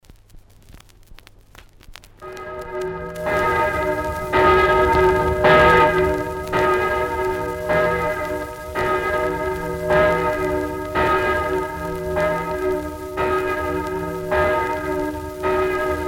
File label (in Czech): Zvuky plaských zvonů; plasy